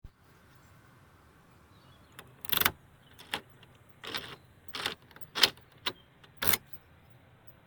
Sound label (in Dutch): Spraak deur van slot